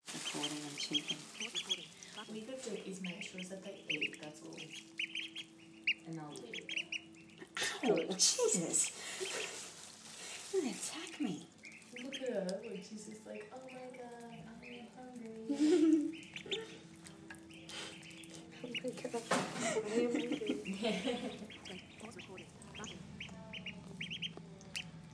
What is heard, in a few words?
ducklings swimming in a sink